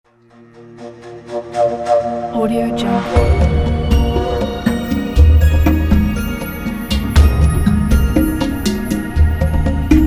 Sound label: travel to the secret forest; cinemasounds